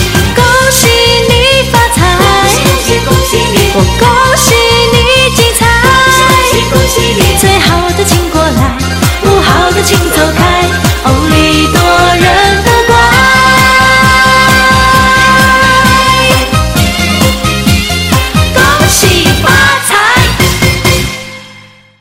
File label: Gong Xi Fa Cai